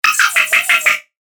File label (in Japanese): ロボの声